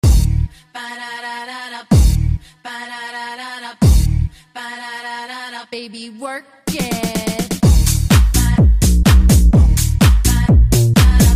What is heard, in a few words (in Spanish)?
MUSICA KINDER